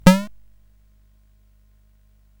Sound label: Paddle Mania, CSG8580R5